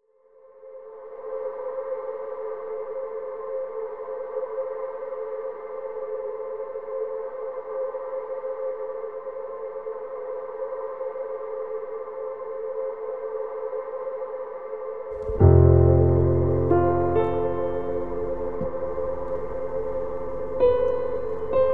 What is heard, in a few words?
Piano Song